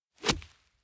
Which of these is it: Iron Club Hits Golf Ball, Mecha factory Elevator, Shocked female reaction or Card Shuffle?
Iron Club Hits Golf Ball